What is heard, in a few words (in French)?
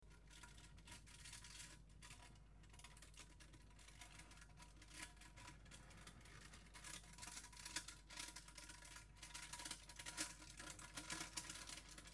papier